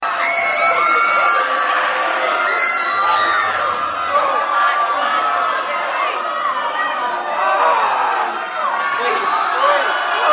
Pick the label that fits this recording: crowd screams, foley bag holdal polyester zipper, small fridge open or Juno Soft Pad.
crowd screams